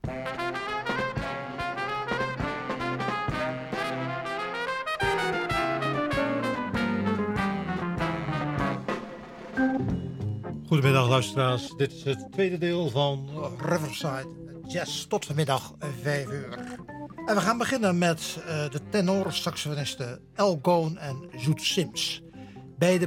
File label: Jazz